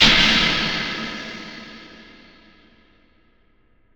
Crash, impact samples

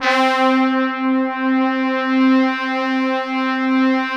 brass, synthesizers; SD1 STAR

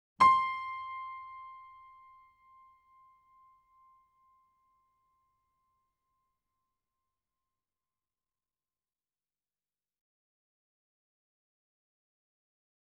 C6 E6 A6 Grand Piano & Dig Piano; KORG; Sound Sampling Collection VOL-3; Grand Piano 88

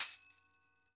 tamb; E-MU Producer Series Vol. 7 – Old World Instruments

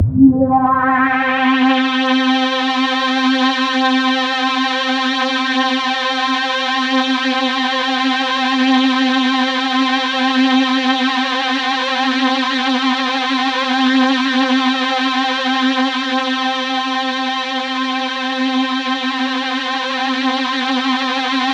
C3, Synth Line; 90 s Sample CDs; NiceBeats; SynthLine VOL-18

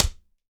step, handy percussion

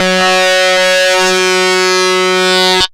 HARDSYNC F#3, lead synth; Best Service Alpha Dance vol.2

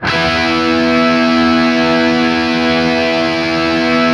5TH E looped, Guitar; East West; Steve Stevens Guitar Samples Collection

